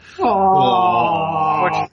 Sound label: Dawwww